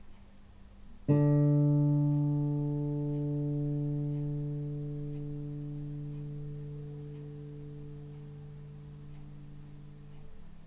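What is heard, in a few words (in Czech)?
kytara d dur, guitar D major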